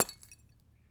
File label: Clay Pigeon; ping pong gun; Toybox